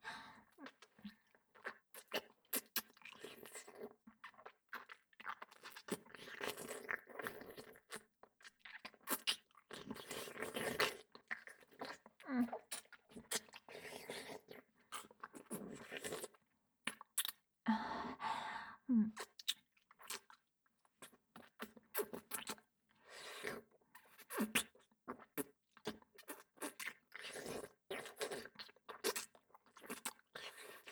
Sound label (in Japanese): フェラ, ASMR